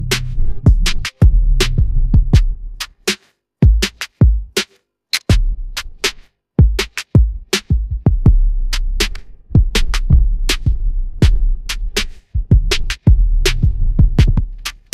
ringtone hiphop

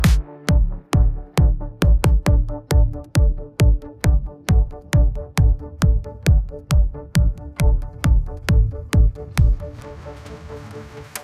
ringtone edm

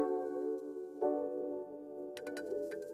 notification

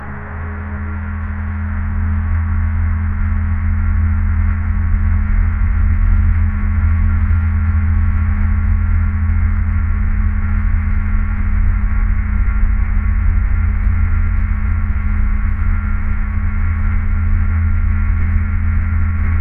ambient space

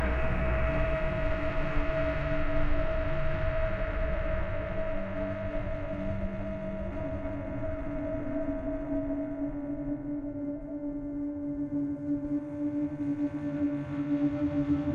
ambient nature

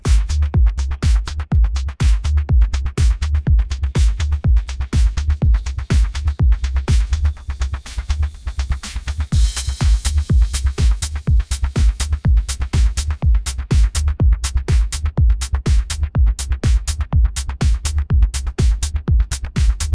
ringtone edm

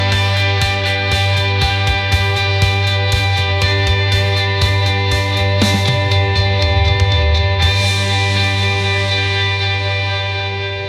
ringtone rock

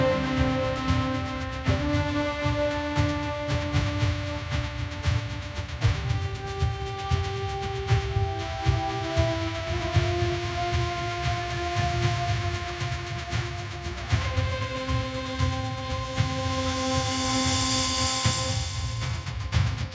ringtone cinematic

